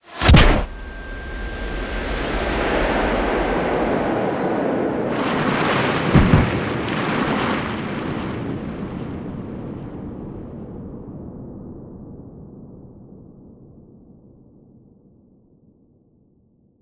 fang Punch

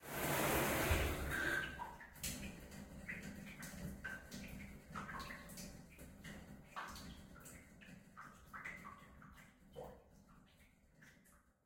shower Muffled End

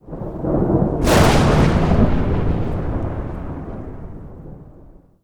slapstick Thunder

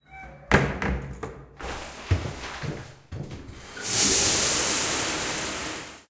shower Start

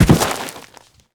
garden fall

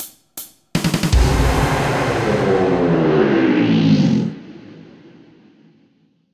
bad Music

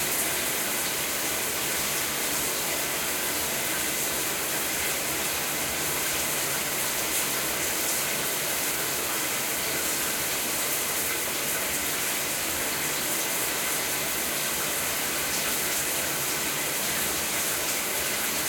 shower Loop

